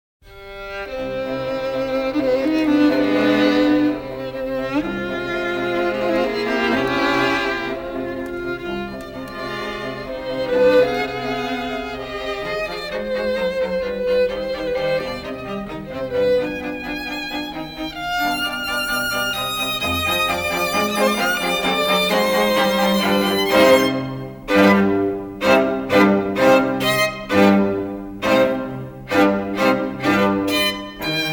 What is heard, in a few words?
beethoven strings louder; cloudbounce; mastered